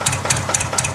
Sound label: tractor